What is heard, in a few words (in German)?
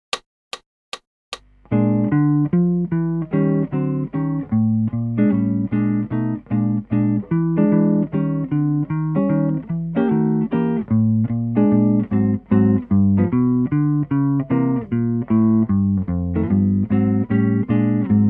Jazz Blues, Gitarre